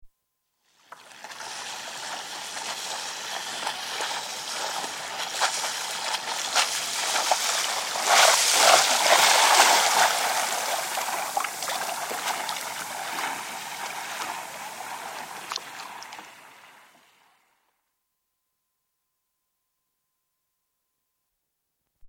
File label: Windsurfing, board passes (Recorded on nearby boat); BBC